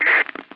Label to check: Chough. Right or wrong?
Wrong